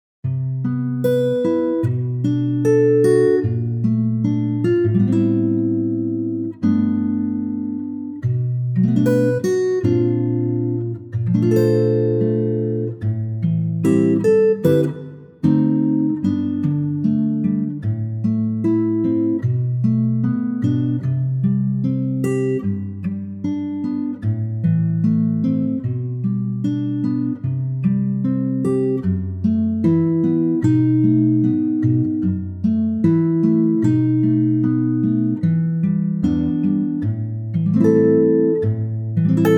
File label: G acoustic guitar